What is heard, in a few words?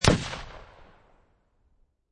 Rifle Thompson Center Encore 7Mm Single Shot Exterior At 45'